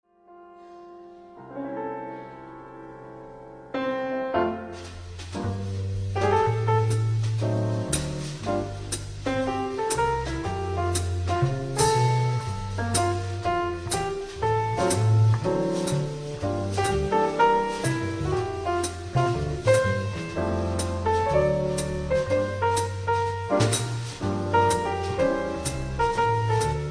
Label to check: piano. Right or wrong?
Right